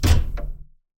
detach, fire

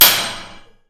hit

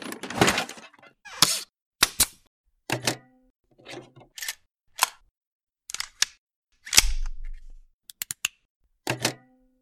crafting

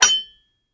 pickaxe hit

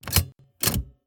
lever

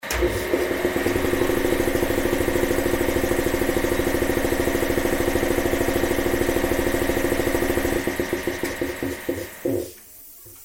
shaker; Mechanic

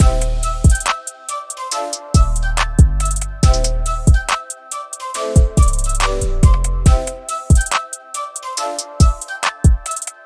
music; Bunny

